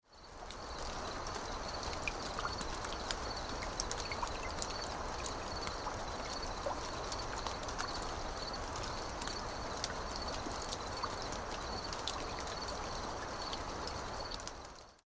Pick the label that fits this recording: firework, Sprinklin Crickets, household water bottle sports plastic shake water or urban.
Sprinklin Crickets